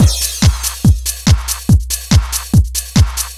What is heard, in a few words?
Drum Loop